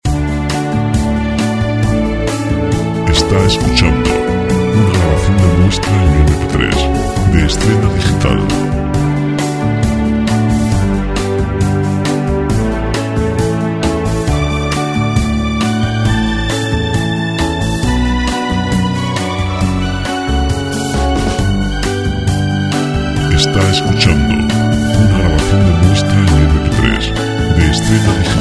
alegre 135 pop; Música pop

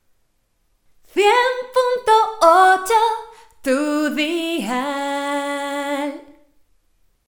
dial jingle radio, cantante. Dial cantado 100.8. Melodía compuesta por ella

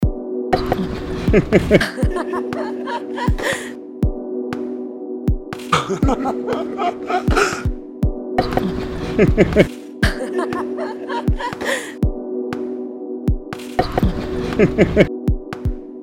risas musica

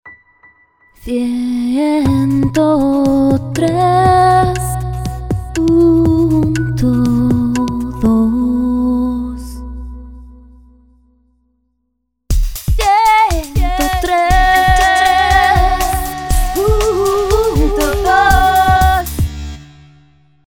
dial cantado 1032 jingle radio, Melodía compuesta por ella

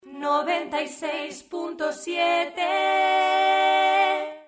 cantante jingle, jingle cantado. Dial de radio noventa y seis punto siete.

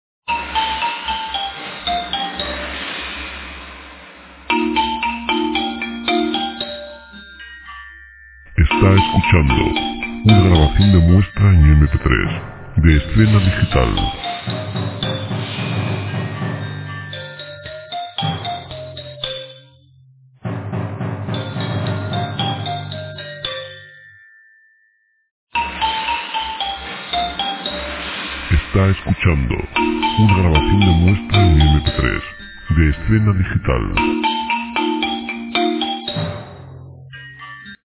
oriental varios

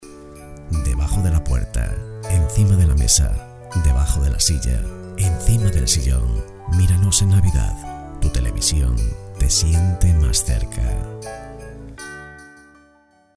jingle navidad; Voz de Papá Noel; Indicativo de Navidad número 1

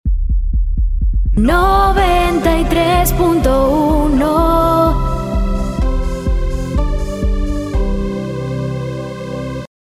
cantantes jingles dial cantado, Grabación del dial 93.1.; Segunda versión

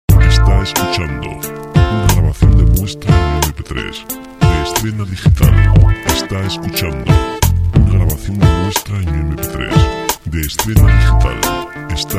medio melodico; Música para audiovisuales; telefonos

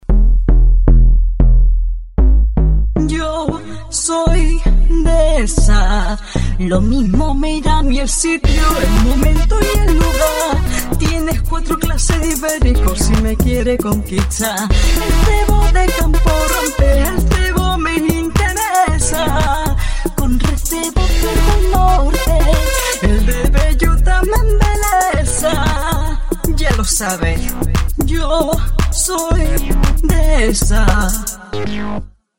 hiphop cancion spot

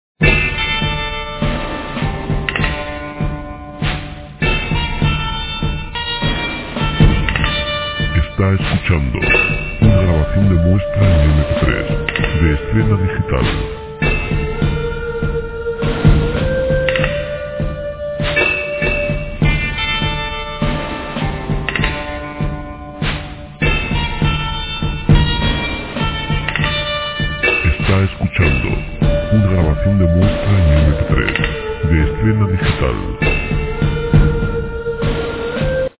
etnic western documental

